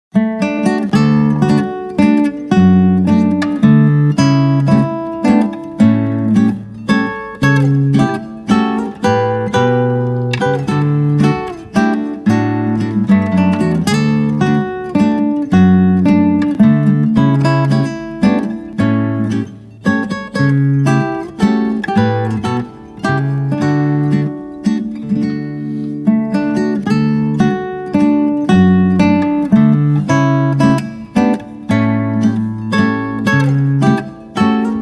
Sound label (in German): Walzer Landler Walzer